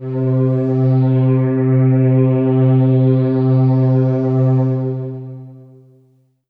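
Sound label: Kawai K11 Syn Strings 2 C3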